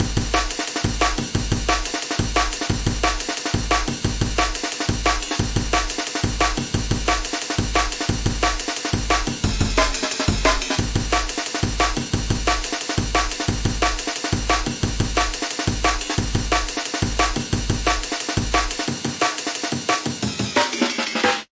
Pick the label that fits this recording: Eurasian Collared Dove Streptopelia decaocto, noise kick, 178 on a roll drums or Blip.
178 on a roll drums